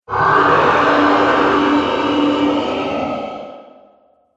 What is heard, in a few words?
Downstairs Dragon, monster; Monster Sound Effects; horror sound effects